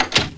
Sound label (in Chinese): 关门; 日常生活; 特酷声音素材库